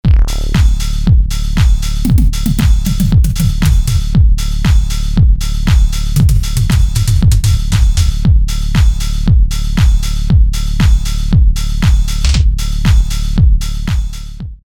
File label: Disco Diva; Mix; Alesis Rap Techno Dance